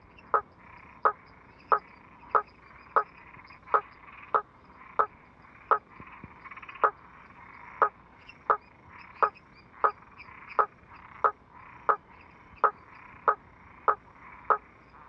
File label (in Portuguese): Boana faber canto anuncio